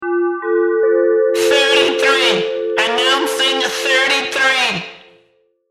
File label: Jingle